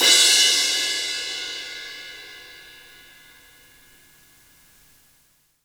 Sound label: CRASH hard, Drum Kits; Big Fish Audio - DOM; Redrum